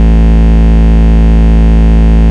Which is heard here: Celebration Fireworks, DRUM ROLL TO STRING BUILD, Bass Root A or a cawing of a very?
Bass Root A